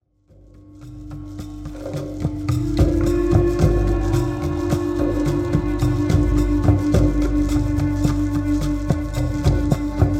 Mindfulness Drum

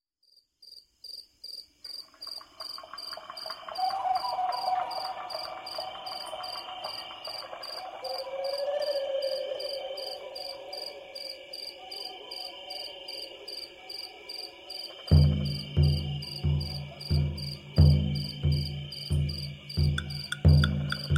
Forest Spirit Drumming

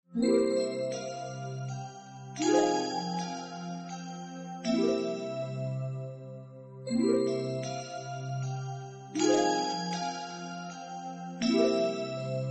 Sacred Voice

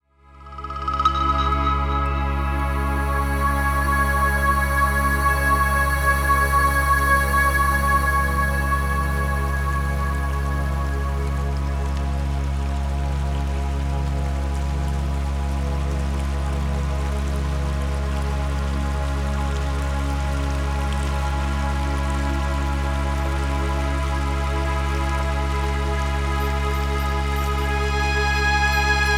Music for Reiki and Meditation

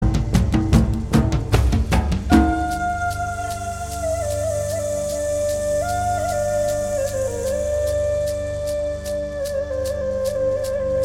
Sacred Drum

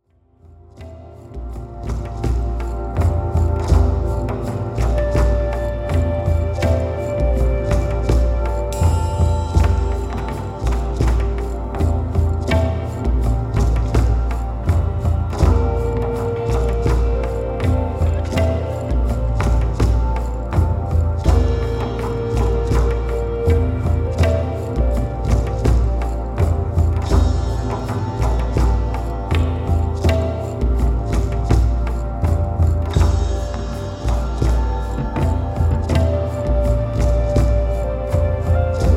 Kundalini Drum

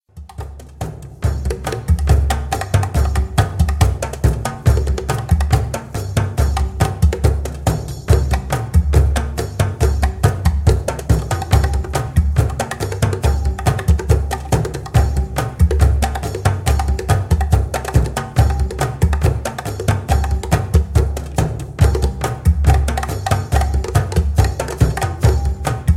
Drum Call

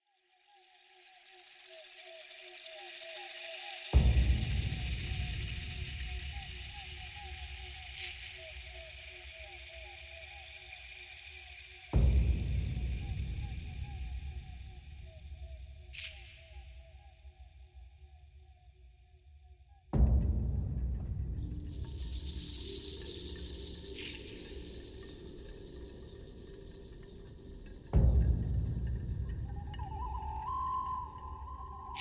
Earth Spirit Drumming

